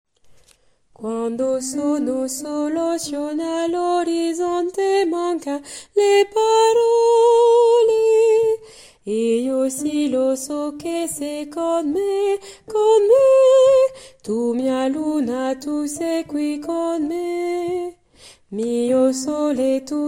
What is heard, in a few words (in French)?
Con te, tenor couplet